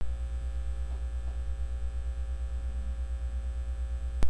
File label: door